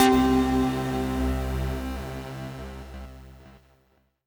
Synth C, Synth Prophet One Shots; Electronisounds

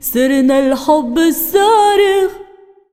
SINGER, ArabianTraditions

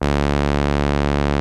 ARP 2600 D3, synthesizer; Hollywood Edge - Giorgio Moroder Rare Synthesizer Collection

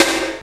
PERC FX, SYNTH PER

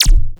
DSP Hit

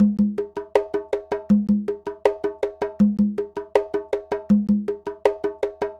Conga, Latin Percussion

